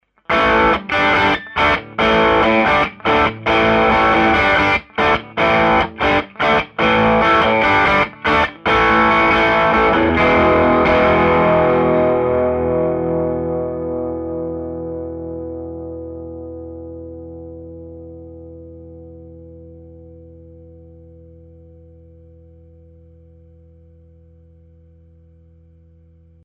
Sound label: trucha sustain